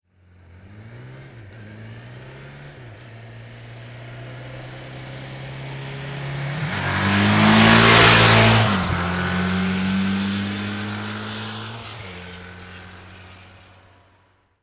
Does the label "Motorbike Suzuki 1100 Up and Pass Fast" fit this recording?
Yes